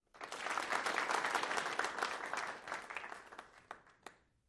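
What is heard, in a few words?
Crowd 50 Short Medium Burst, small interior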